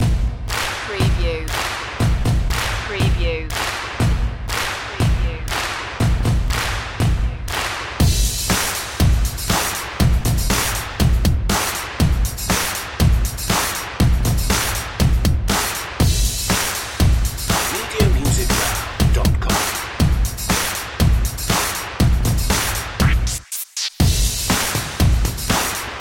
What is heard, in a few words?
clappatronic